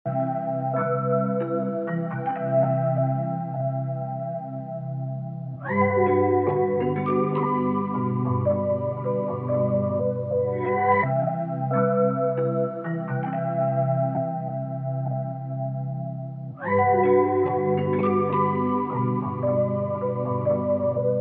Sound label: tapy bells 75 f C